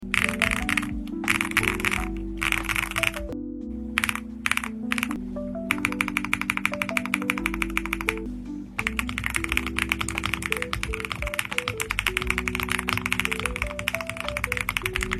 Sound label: Akko V5 Creamy Yellow Pro Switch, keyboard; M1 V5